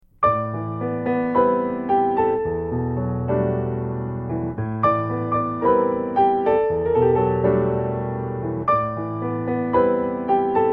solo piano

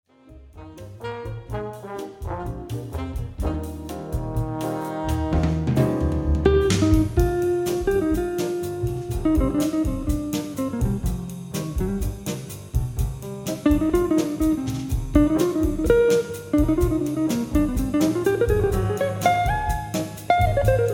guitar solo

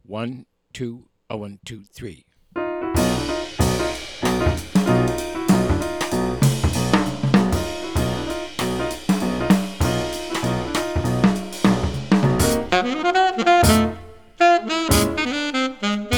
minus bass; count off sets up the intro; play figures and walk for the intro and melody

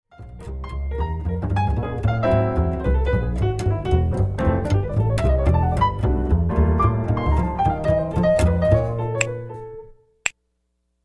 minus drums snaps

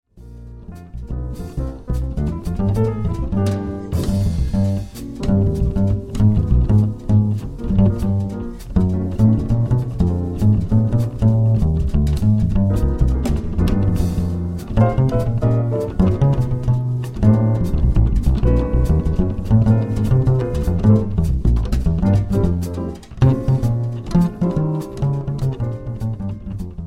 bass solo

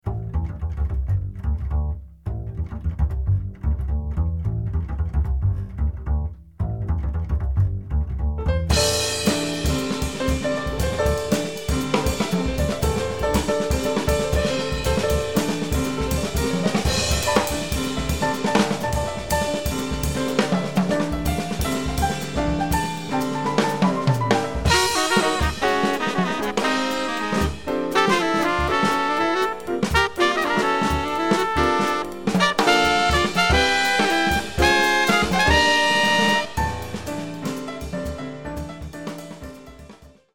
minus Bass

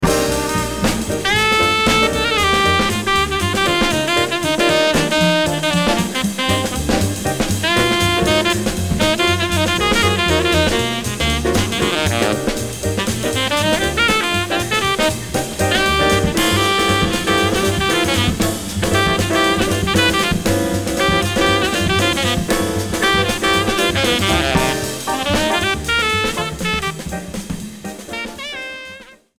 tenor sax solo

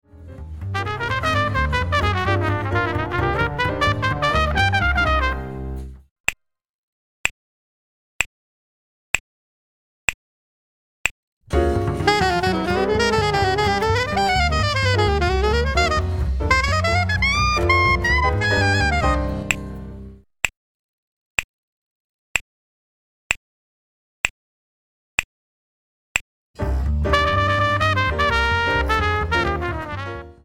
minus drums

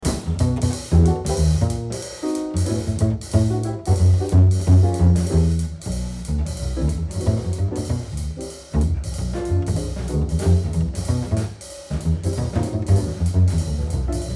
Bass Solo

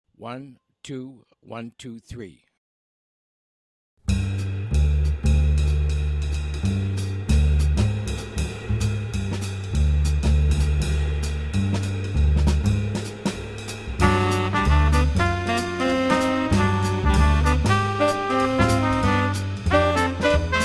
minus piano

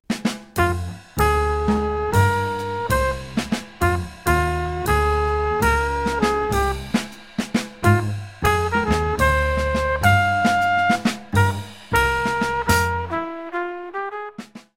medium slow minus Piano

